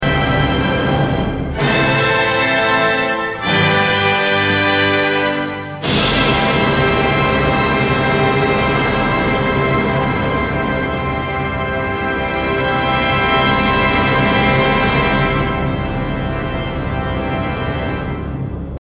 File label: classic intro